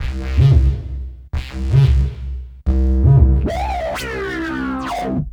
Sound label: Lick Bass, Drum & Bass; Sonic Foundry; Fluid Dynamics Drum & Bass